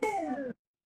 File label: feedback fail